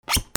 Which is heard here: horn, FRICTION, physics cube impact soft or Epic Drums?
FRICTION